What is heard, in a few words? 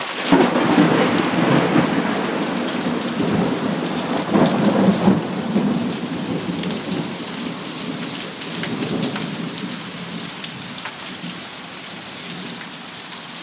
heavy rains thunder pea sized hail in welch okwx